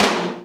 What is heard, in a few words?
TON Md Agrsv, Alesis D4; Drum Machines